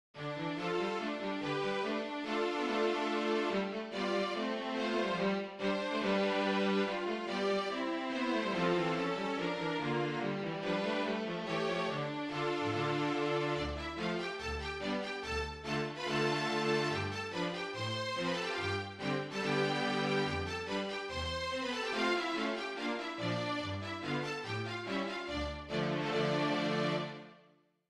Bartok Dances No2, Six Romanian Folkdances; No.2 "Braul"; from Six Romanian Folkdances - Bartok

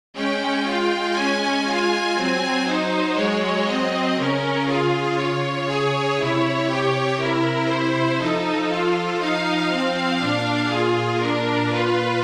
Trio Romeo Juliet, flute trio version; Romeo and Juliet (Main Theme) - Tchaikovsky

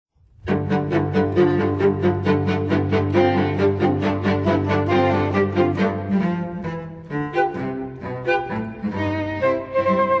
quartet; CHRISTMAS; QUARTET ARRANGEMENT

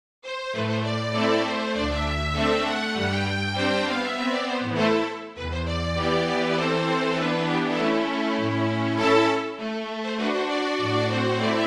Bartok Dances no1, Six Romanian Folkdances; No.1 "Der Tanz mit dem Stabe"; from Six Romanian Folkdances - Bartok

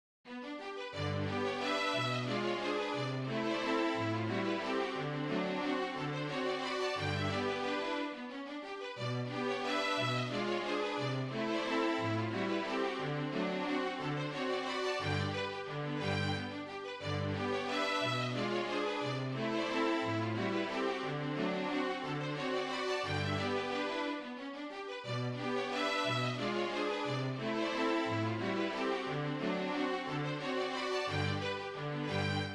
Schon Rosmarin Flute Trio; Schon Rosmarin (Fair Rosmarin) - Kreisler

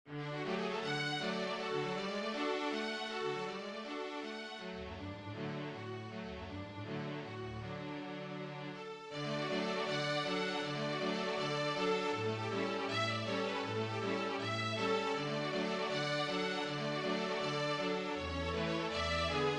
Schubert Trout String Trio; (String Trio Version); Trout (Die Forelle) Op.32 - Schubert

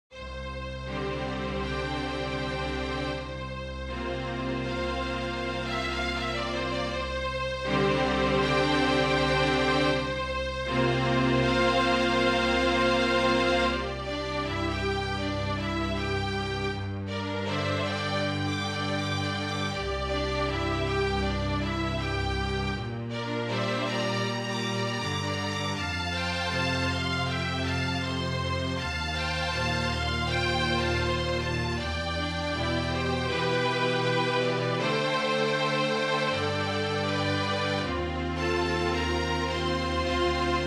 Quartet; QUARTET ARRANGEMENT; BROADWAY HITS; SANIBEL MUSIC PUBLICATIONS

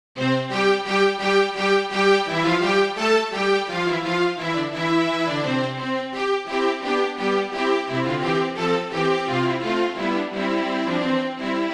Trumpet piece

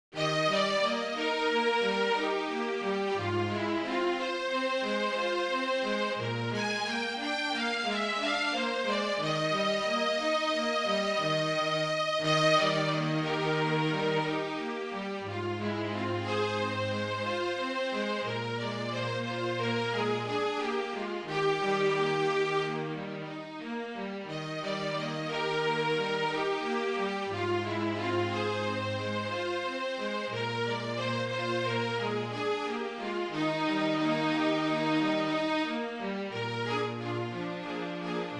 Trio; Beautiful Dreamer - Foster

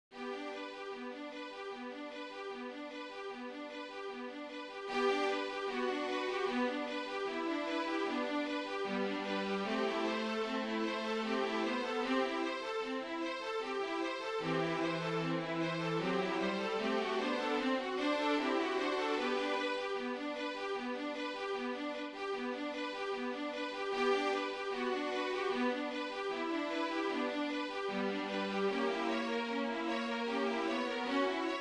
Trio Swan Flute trio; Swan - Saint-Saens

